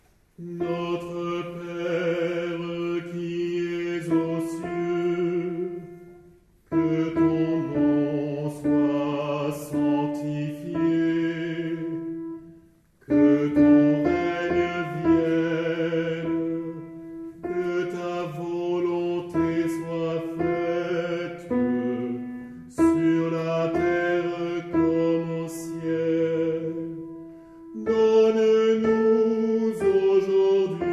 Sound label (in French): Notre Père Alti